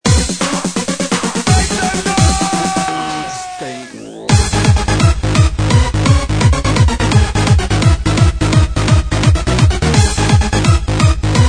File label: hybrid noize